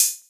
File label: Hhat, Hihat; Equipped Music; Loungin House